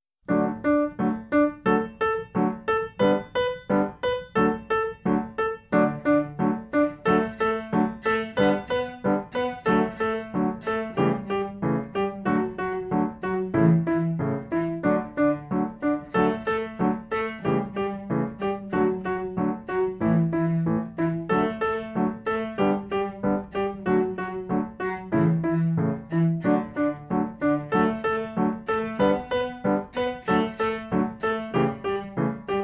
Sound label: Twinkle Twinkle Band